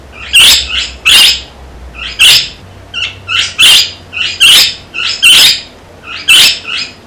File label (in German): rufen, Katharinasittiche, mit lauten Rufen zumindest akustischen Kontakt zu halten; Diese Kontaktrufe